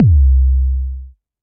Ballistic Boom; Booms; Ableton; Factory Packs; Chop and Swing

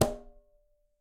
Tumba B Slap, Conga, Latin Percussion; Drums; Drum Hits; Ableton; Factory Packs

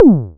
modular pitched, kick; Ableton Foundation FreePacks

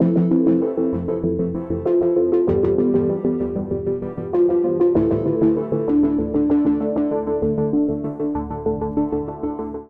Live Key C Major 97 bpm; MIDI Clips; Tonal; Accompaniment; Drive and Glow; Ableton; Factory Packs

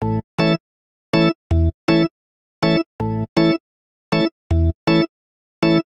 Vamp Polka Organ I - V A Major 80 bpm; Electric Keyboards; Ableton; Factory Packs

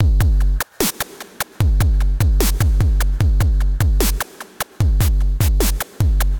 75 Beat, Drum Machines; Fire Bedstuy; Ableton

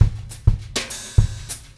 101 Afro (6-8) Brush, African Drums; World

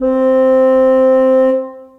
Bassoon Solo Legato Vibrato; Ableton; Orchestral Woodwinds

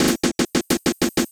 096 Reggaeton Fill, Drums; Hip Hop

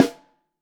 SN Top, Snare

